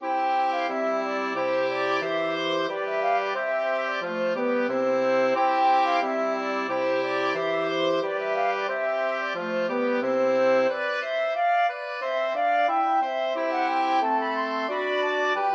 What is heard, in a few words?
flexible mixed ensemble 4 players